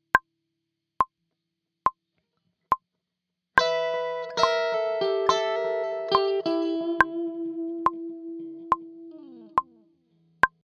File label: Lick